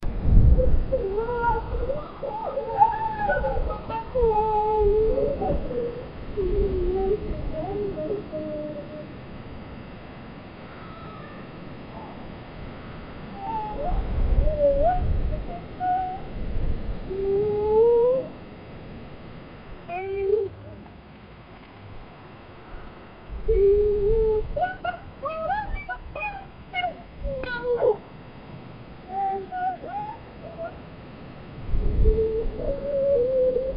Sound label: the echo of girl happy, children's sounds